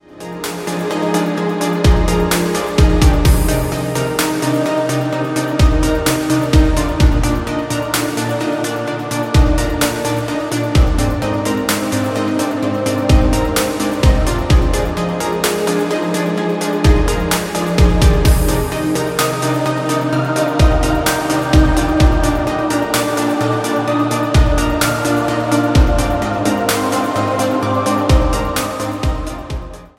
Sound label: Atmospheric Tech-Trends